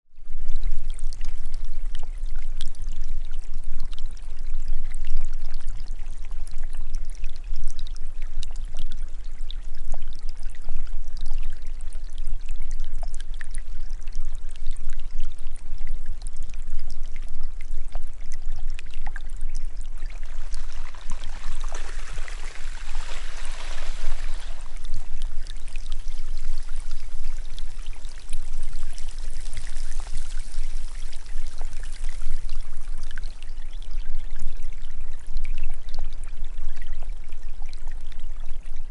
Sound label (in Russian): Tayanie